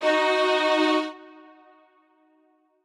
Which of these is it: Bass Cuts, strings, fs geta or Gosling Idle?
strings